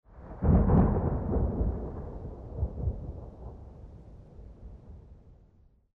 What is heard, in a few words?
lightning Rumble; Champions of Valhalla